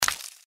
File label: mantis foot; npc